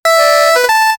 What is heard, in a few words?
new record mobile game